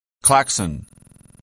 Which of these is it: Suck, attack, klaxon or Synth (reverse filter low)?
klaxon